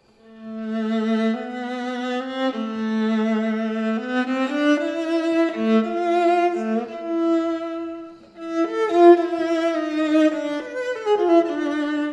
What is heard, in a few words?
viola Sound Sample; Model 1